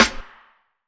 ambient snare one shot f key, snare drum sound tuned to the F note; snare-drum-samples